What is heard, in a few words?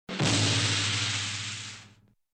Metal Hit, kinda